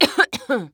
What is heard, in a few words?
Human Female Cough Clear Throat Cough